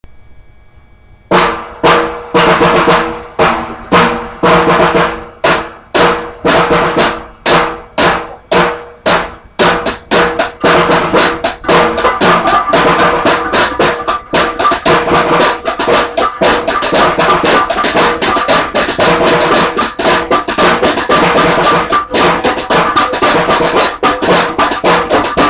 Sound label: HIGH HAT AND SNARE DRUM AND TAMBOURINE